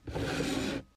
Chair Move; Game rip; MiSide

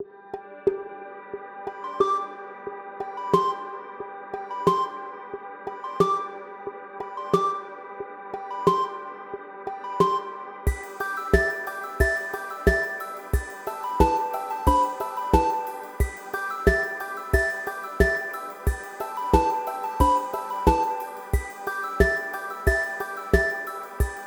Dance 2 Norm; MiSide

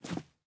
Step Marble; MiSide

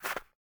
Snow Ball Floor; MiSide

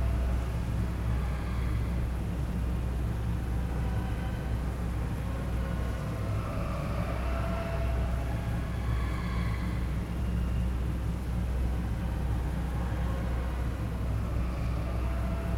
Ambient Start; Game rip; MiSide

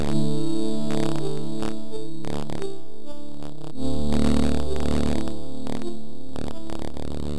Music Wait; Game rip; MiSide

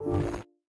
System Hide; Game rip; MiSide

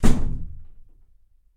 Door Close; Samo; MiSide

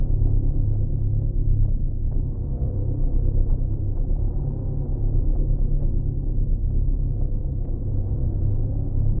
Ambient Horror; Game rip; MiSide

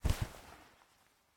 Carrot Take; Game rip; MiSide

